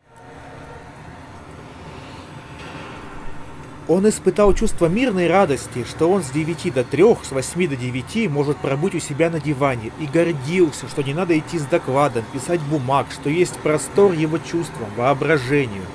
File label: Recorder noise; honor choice headphones pro